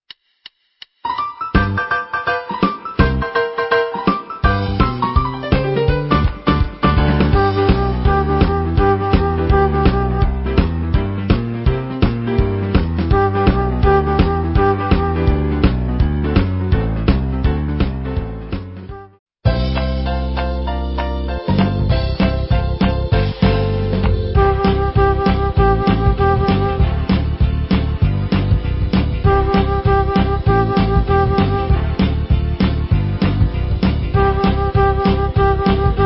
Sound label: Flute Oboe Samples